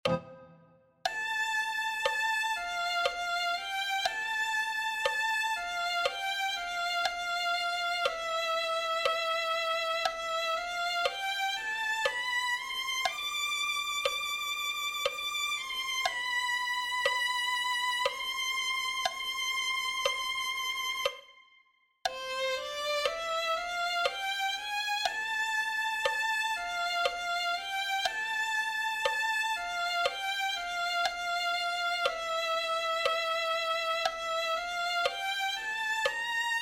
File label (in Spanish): violin1